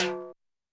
Timbale, 505; classic machines

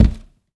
Plastic Dustbin, household rhythm samples Hits

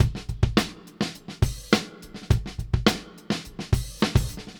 Beat Loops, 104; Zero-G; Monster Beats

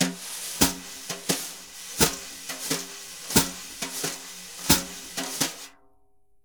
BRUSH, Jazzistic; Univers Sons

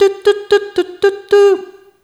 Rhythmic G

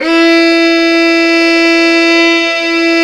violin E2, Balkanish Traditions

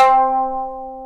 MANDOLIN C 3; AKAI S-Series CD-ROM Sound Library VOL-7

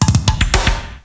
BEAT, Beats 113-123bpm, Hip Hop; E-MU Formula 4000 Series Vol. 1; Hip Hop Nation

